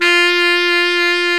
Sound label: SAX COMBO